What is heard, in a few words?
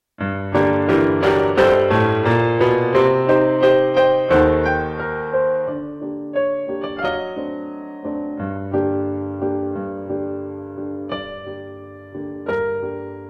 chansonette - with solo, Clarinet